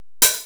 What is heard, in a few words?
hihat